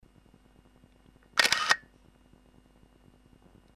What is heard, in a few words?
X-1 MOTOR, camera